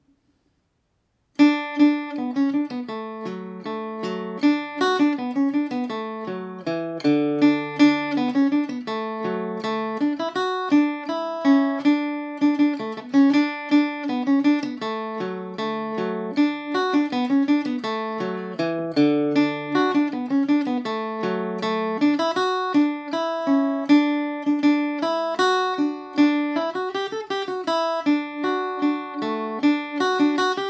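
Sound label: D major at 40, irish mandolin; john ryans polka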